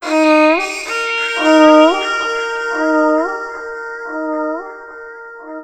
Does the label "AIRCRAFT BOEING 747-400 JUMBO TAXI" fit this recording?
No